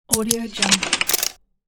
automatic camera shot

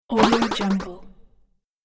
speedy jump

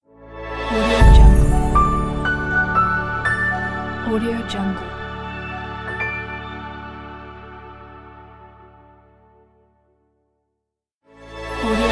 happy opening logo

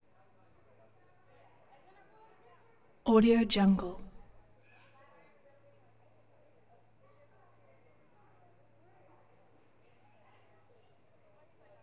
distant forest party with children

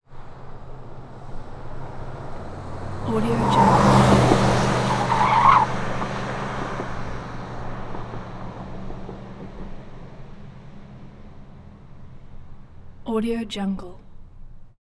chevy suburban suv pass by skids bumpy at tail

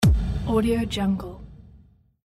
kick hit fx

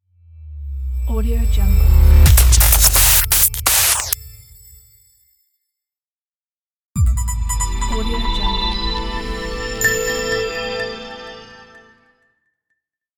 abstract glitch logo reveal pack

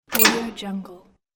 switch in

